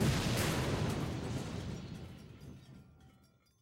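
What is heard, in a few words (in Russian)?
металлический монотонный грохот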